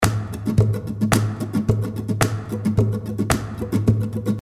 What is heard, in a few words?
Guitar Percussion Loop